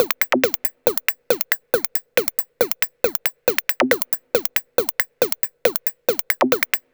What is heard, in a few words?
Electronic Percussion